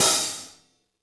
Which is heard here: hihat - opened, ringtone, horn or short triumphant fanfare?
hihat - opened